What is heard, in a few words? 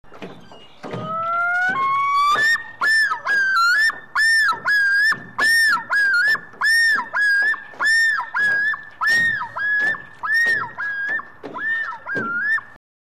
loon